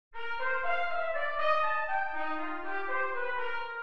Min7 en Cm

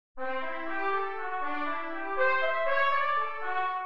Maj7 inv0 en C; Bongamella

